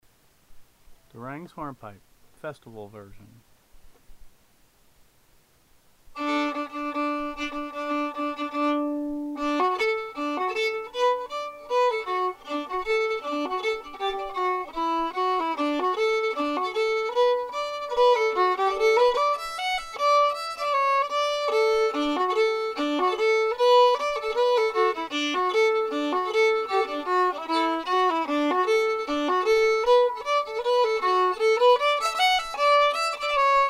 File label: Hornpipe festival version fiddle; festival tunes